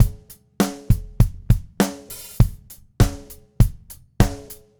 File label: Red Maple Groove 20-inch Kick 90; 2002 DW Red Maple Kit; Sonic Foundry (Sony Creative Software); Vital Drums